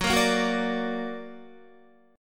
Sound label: Gb Augmented Guitar; Gb+ strummed